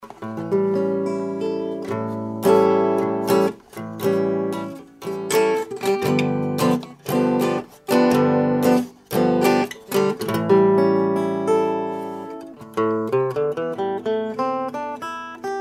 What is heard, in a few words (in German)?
akustik pur, Elektroakustik Telecaster